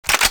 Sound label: svd draw